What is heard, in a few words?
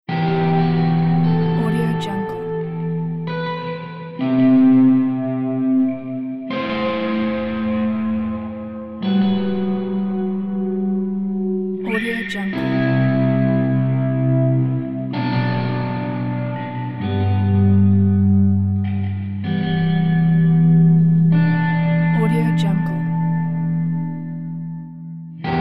ambient guitar